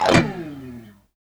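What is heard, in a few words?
chorus slide 1 L, guitars; Spectrasonics; Hans Zimmer Guitars VOL-1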